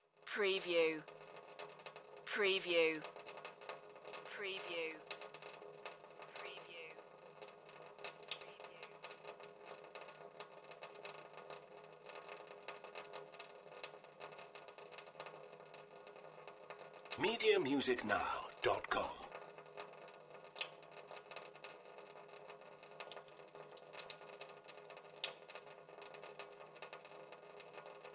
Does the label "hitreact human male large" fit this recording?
No